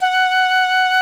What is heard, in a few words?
F#3 PICCOLO; Northstar; New Gold VOL-2